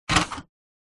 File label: Epson inkjet printer paper tray push in